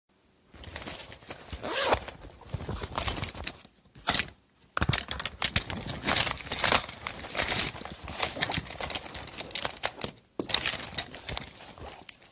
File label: Pencil case